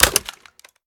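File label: sniper reload empty fast mag in; gmod; iw8; xmike109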